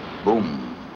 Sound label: BOOM; BURTON BOOM